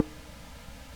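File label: ORGAN Flute 8' noise, Farfisa; Keyboards of The 60's and 70's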